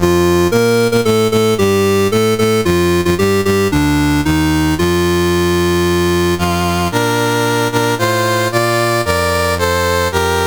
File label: Slovenia, National Anthems, CSG8580R5; Dave Marquis